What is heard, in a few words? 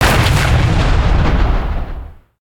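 bomb explosion; War Thunder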